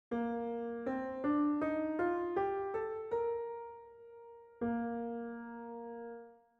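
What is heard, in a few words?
Bb major scale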